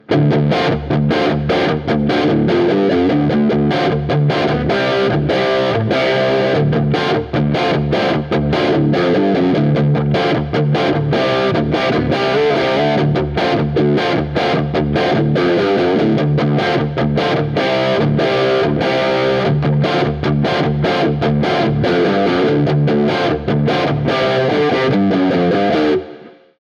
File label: harley benton erx84 modern